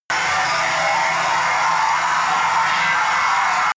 hard buzz reverb, sciene fiction

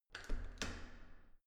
entrance door handle use normal

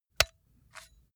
metal pole

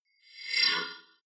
swell short industrial; sweeps-swells

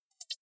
hover high, signale

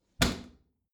bathroom cabinet close heavy; objekte; tueren

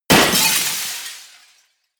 smash, baugewerbe

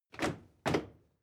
step crowd march loud stop; schritte

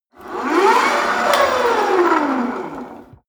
rope trainer pull sequence fast short; sport freizeit; fitnessstudio

